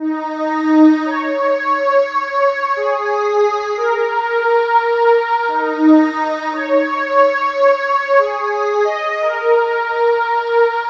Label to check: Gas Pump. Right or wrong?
Wrong